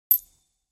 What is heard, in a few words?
UI moving option to one anoter